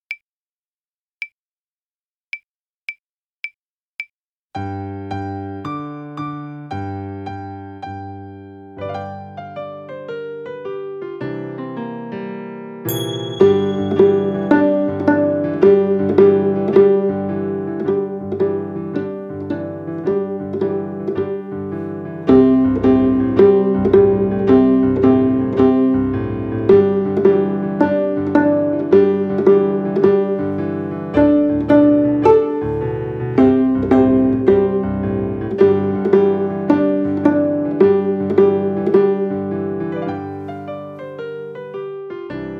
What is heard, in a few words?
First Blues Altviool